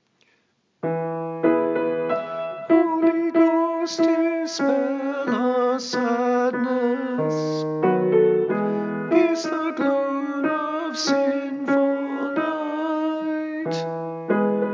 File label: Tenor; Bach; Holy Ghost Dispel